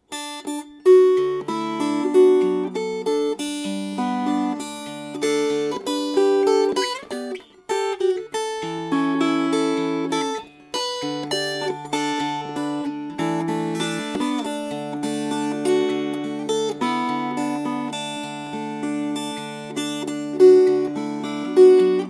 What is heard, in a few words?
Schaladi Fingerpicked